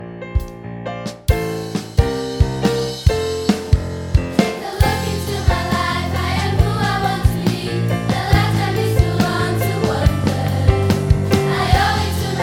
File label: Sample Of Students And Band